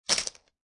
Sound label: Poker Chip Piles Dropping On Poker Chip Piles; Playing Cards, Poker chips; Card Sound Effects